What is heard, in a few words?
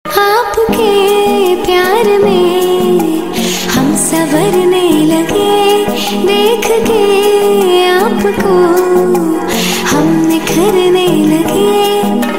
romantic unplugged ringtone; raaz movie